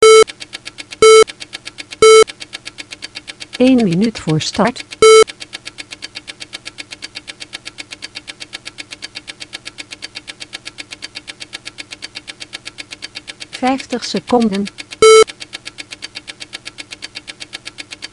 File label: countdown